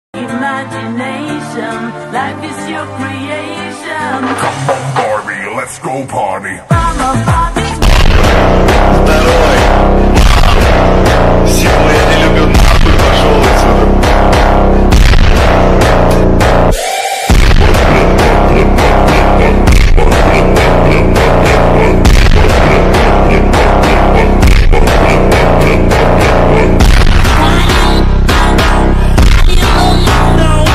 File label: phonk